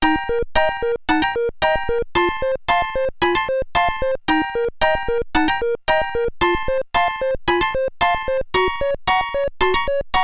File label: BGM Shopping; Super Mario Maker